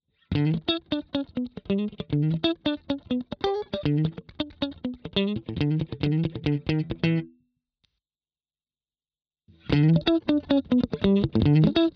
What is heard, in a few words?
Comp Dry Studio Optical Dual Single Notes; ATLAS